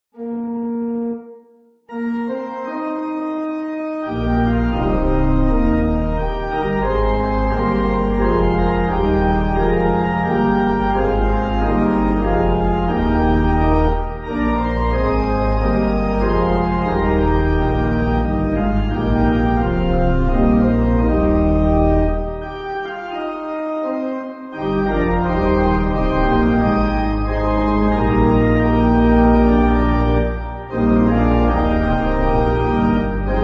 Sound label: Pipe, church music